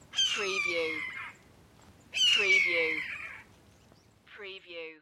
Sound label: Red Tail Hawk Two Calls